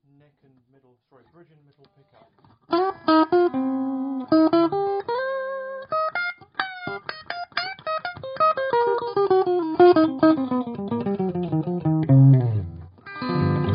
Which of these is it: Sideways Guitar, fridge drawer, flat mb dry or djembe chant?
flat mb dry